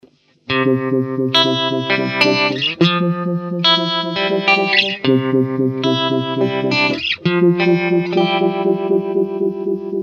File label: Vibe